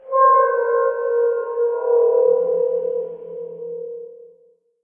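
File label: underground metal; blackforest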